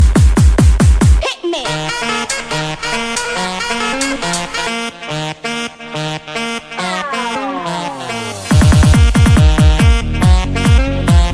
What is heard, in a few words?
drunken ringtone